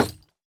竹木悬挂告示牌破坏声

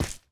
树脂放置声

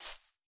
杜鹃踩踏声

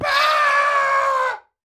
山羊尖叫死亡声

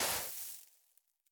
刷子刷沙完成声

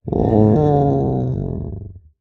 待机声; 嗅探兽

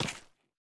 树脂踩踏声

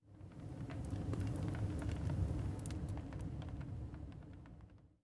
高炉 燃烧声

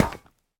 下界合金踩踏声

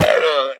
羊驼死亡声

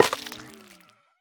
幽匿催发体破坏声

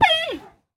熊猫受伤声

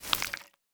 沼骸环境声; ambient

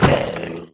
潜影贝死亡声

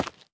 凝灰岩踩踏声

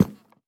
樱花木悬挂告示牌踩踏声

